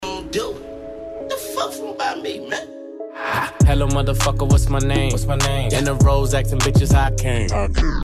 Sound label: rap